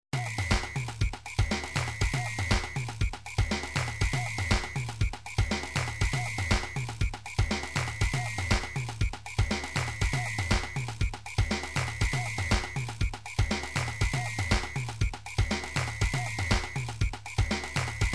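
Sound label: drums pattern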